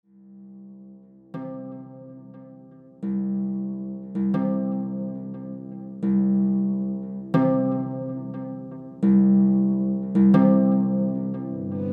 folk music

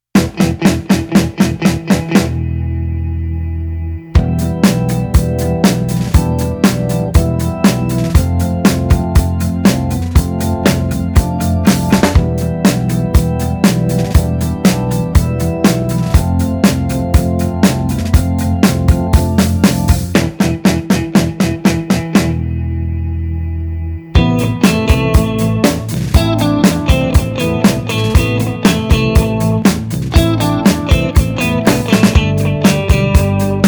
soundtracks

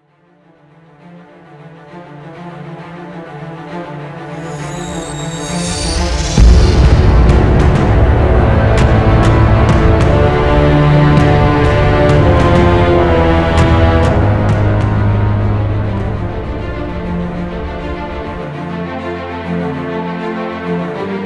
soundtracks

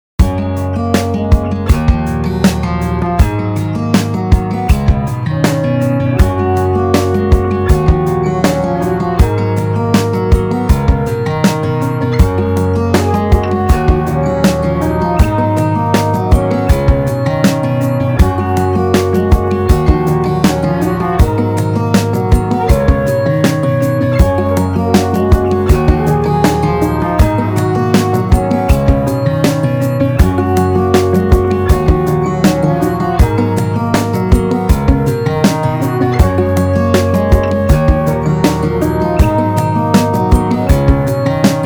folk music

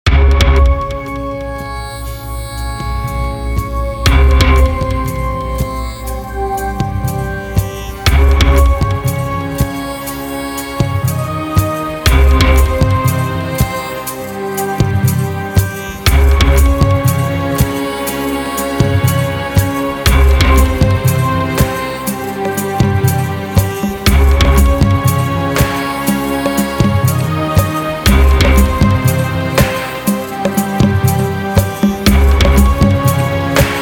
folk music

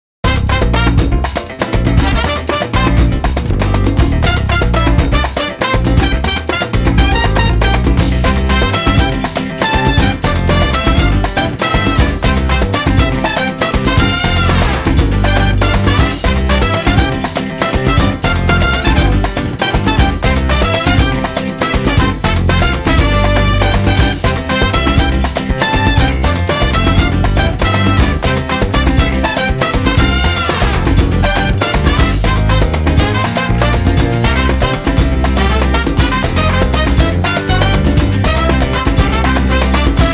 folk music

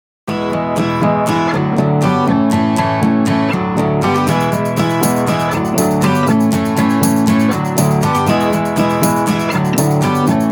folk music

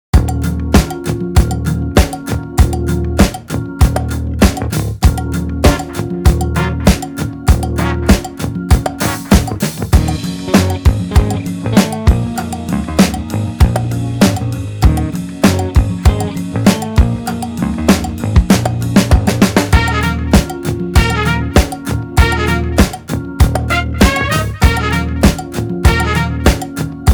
soundtracks, 98 bpm

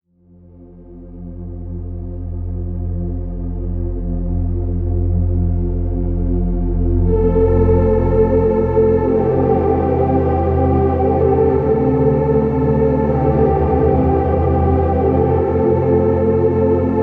soundtracks

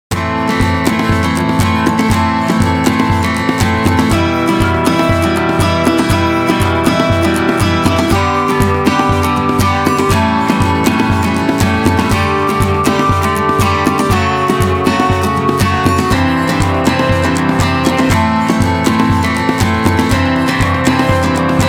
folk music

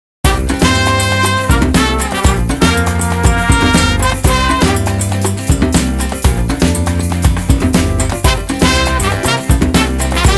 folk music

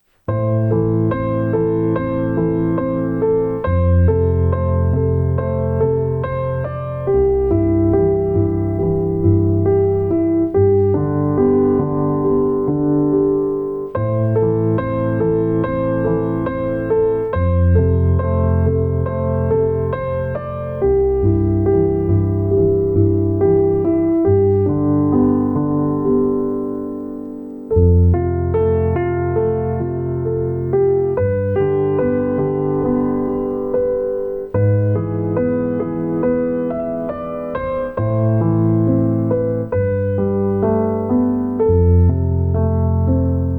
piano

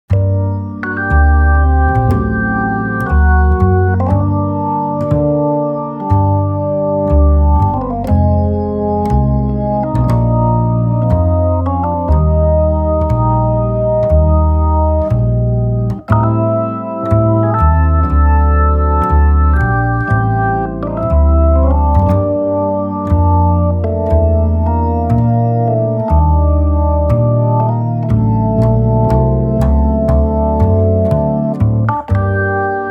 blues, 120 bpm

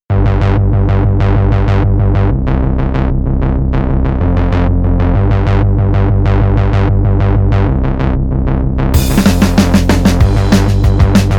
soundtracks

